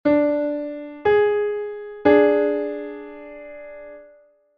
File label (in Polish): tryton d1 gis1; konsonans i dysonans